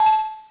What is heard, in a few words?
BEEP FM